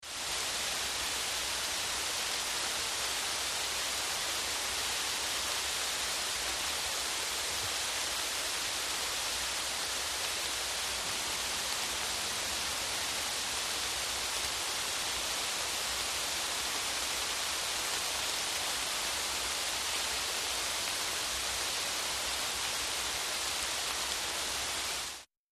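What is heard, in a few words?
Heavy Rain On Leaves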